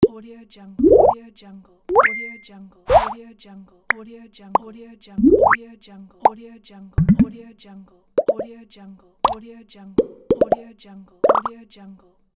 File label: bubbles pop